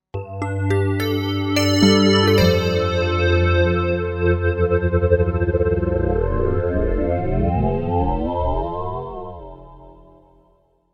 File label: Montage